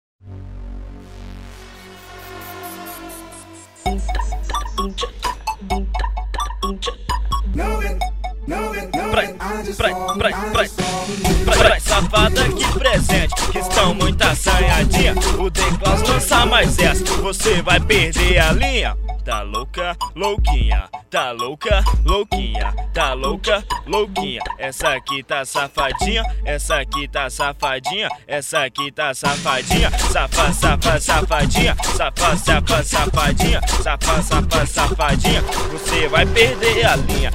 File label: funk